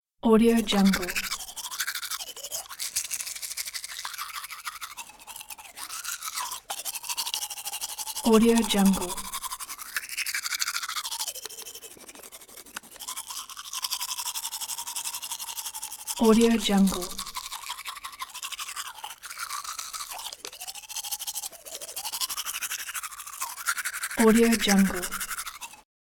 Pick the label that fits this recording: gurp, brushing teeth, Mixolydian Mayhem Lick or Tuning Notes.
brushing teeth